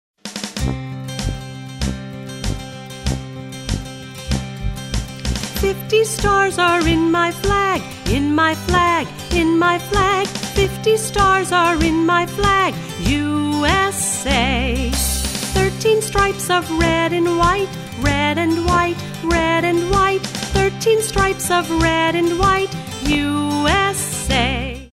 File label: usa song